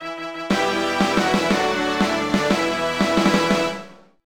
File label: BGM Victory; marvel super hero squad 2009 nds gamerip